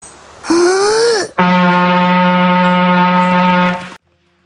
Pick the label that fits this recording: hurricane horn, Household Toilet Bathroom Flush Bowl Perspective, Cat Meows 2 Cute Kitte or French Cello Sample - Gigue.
hurricane horn